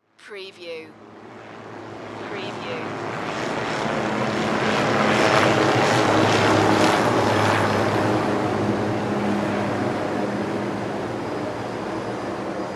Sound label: Helicopter, Fly Overhead, Fast